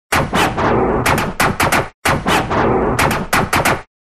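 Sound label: scratch sound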